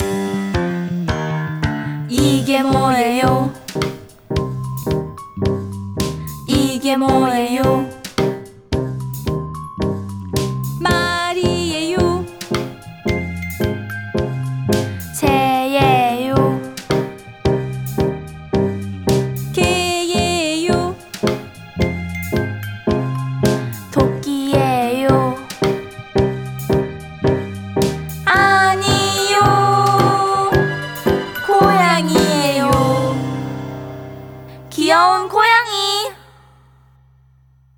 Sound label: song korean